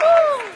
"woo"

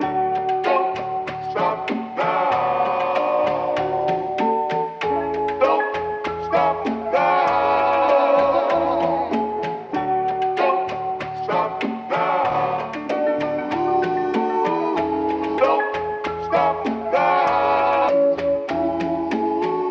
vibes and voice, phonky loops

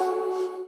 lamb, Voice; Notes

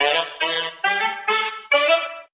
sax, Loops; Phonky

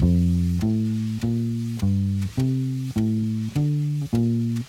bass, Loops Phonky

